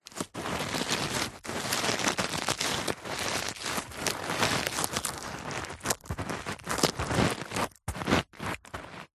stress ball rubber squeezes; foley; zapsplat

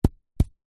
sport cricket bat hit ground grass x2; zapsplat

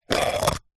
food vegemite jar lid spin open; zapsplat

